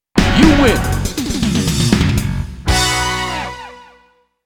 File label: impact! (win); Bloody Roar